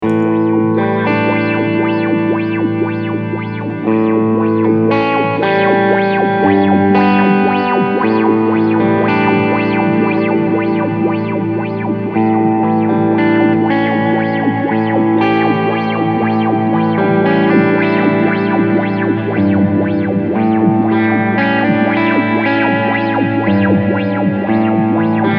filter

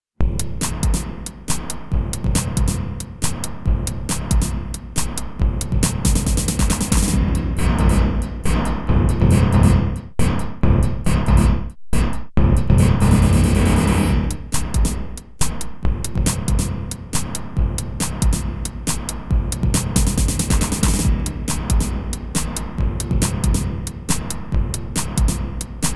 Spring Reverb Drumloop plus VCA

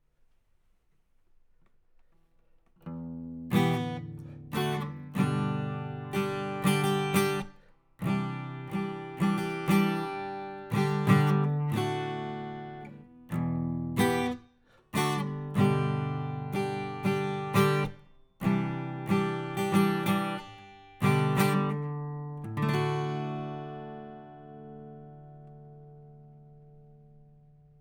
Basic Guitar, WA 47

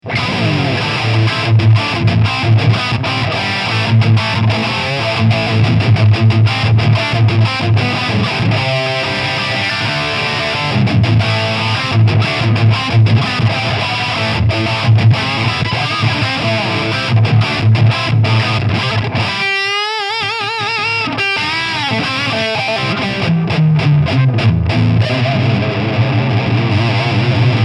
EVH Wolfgang Standard High Gain

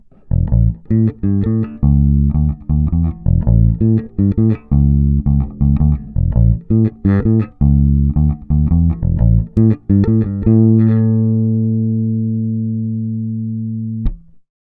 Elektron Analog Heat, Ebass Clean

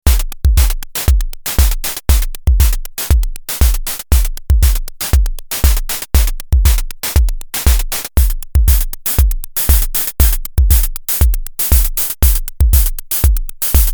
noise hihat filter; twincussion

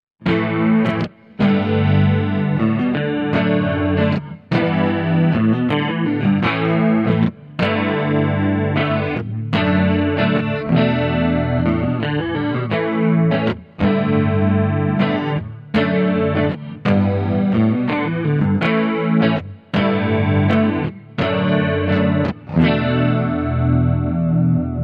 Eventide MicroPitch H3000

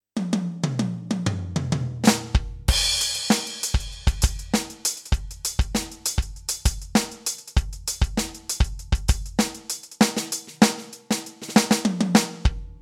Command Kit Groove Funk

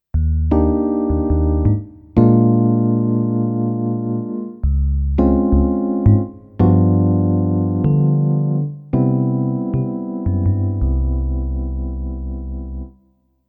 Startone MK 400 E Piano

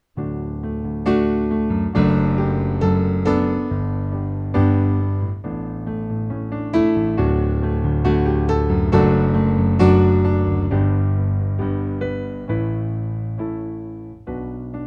Thomann DP-28 Plus Japanese Grand

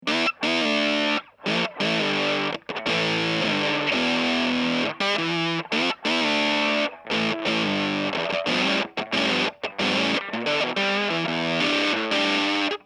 Fuzz God Fuzz Sputter; Sputter 100

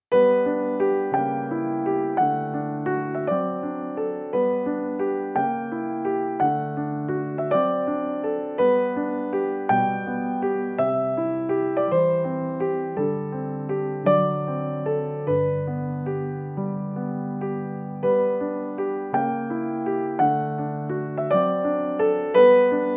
Nord Grand Amber Upright; Schumann Fremde Laender

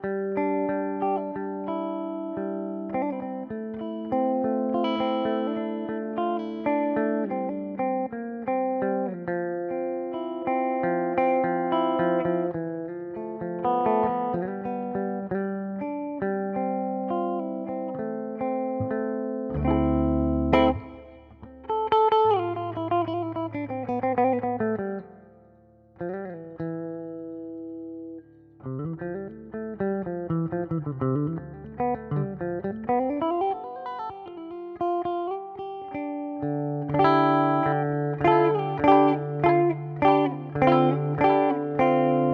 Zoom G11 Regular clean

